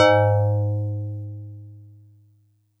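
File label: StarPiano DX100 G#6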